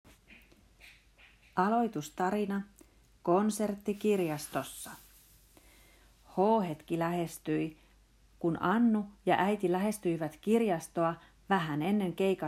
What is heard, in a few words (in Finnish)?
Konsertti kirjastossa